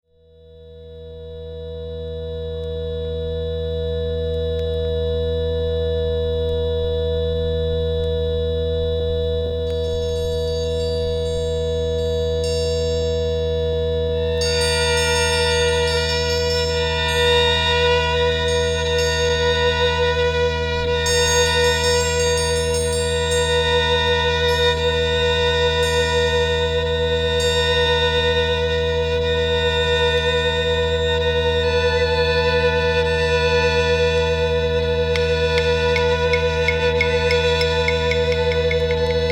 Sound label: musica nova contemplativa